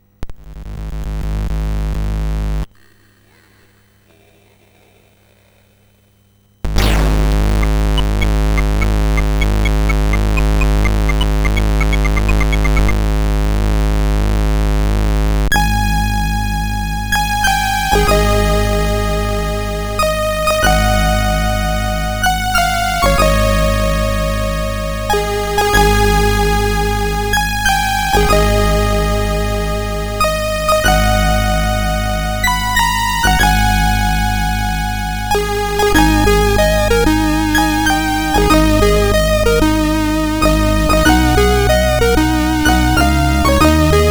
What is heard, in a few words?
MOS 6581R3; SIDBench